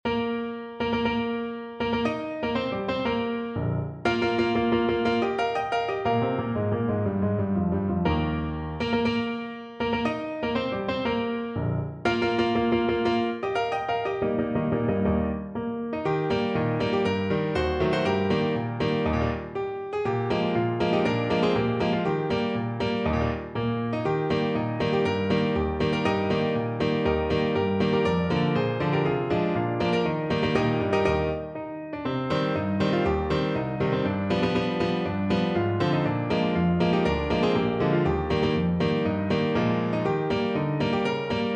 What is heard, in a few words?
national anthem piano; cuban